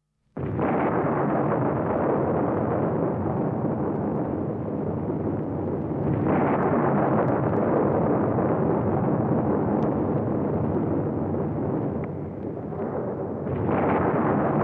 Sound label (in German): anhaltender donner; Horrorgeraeusche